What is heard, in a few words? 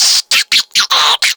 Infested Minion Bark; Warframe